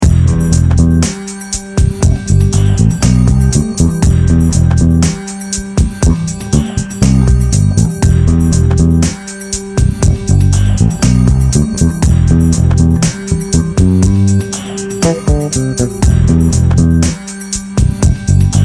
Aeolian Groove, bass; Minor-key grooves; key of G; Minor-key grooves are common in R&B, rock, soul and many other genres.

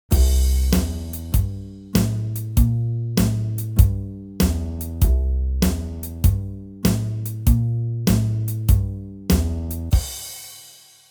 No Bass

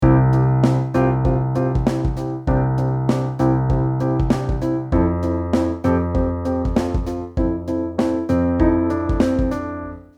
Add2 Movement with drums

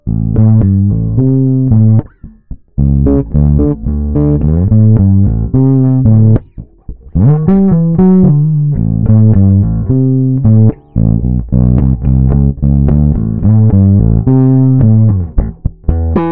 bass edgy distortion

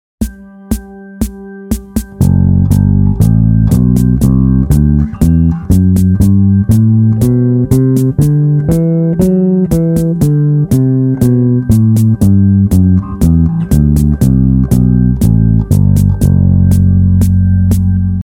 Locrian Mode, bass; Locrian mode is usually considered dark, dissonant and mysterious. It’s like Aeolian mode with a flatted fifth (in this case, C instead of C#).; a bassist's guide to modes

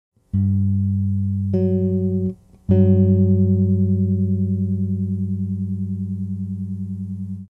Major Seventh, bass; chords and double stops on bass

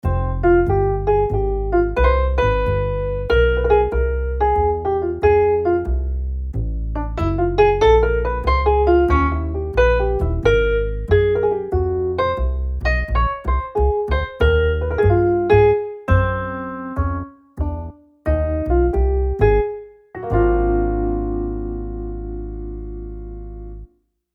Piano and Vibes plus bass

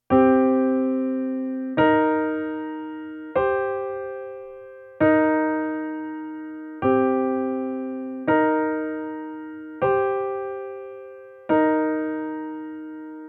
Seventh Chord Stretches

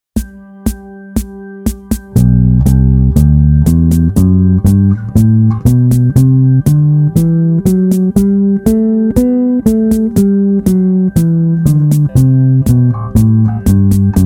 Phrygian Mode, bass; the second scale degree is flat — the root and the second note in the scale are only a half-step apart; flat 2; a bassist's guide to modes

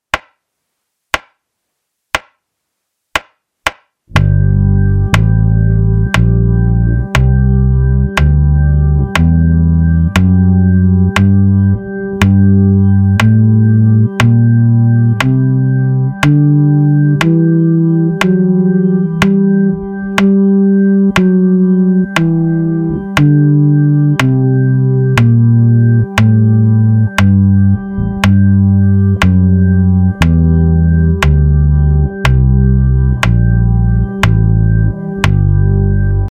Jazz minor, bass; a bassists guide to modes

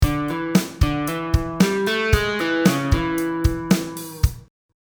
Guitar riff

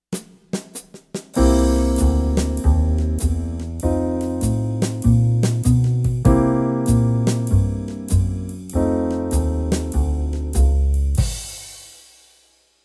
No Bass

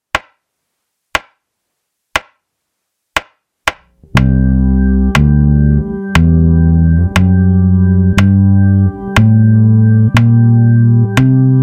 Lydian b7, bass; a bassists guide to modes

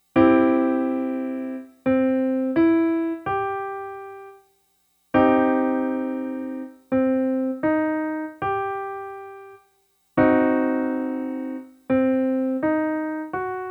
Chord Qualities

